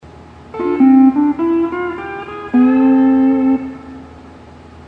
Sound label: hawaiian piece